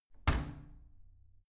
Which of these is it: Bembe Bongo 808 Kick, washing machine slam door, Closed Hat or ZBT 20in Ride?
washing machine slam door